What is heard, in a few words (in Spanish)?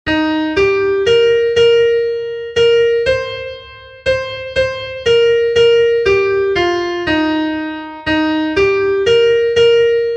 SOPRANO, himnos en piano; cantos del camino